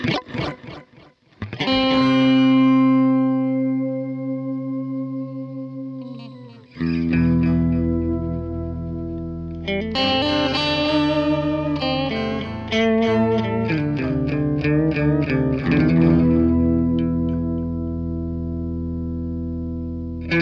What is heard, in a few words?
MXR Joshua Ambient Echo Voice